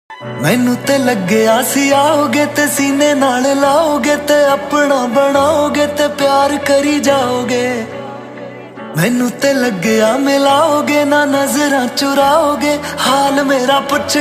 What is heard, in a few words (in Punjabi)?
Punjabi sad song; sad love status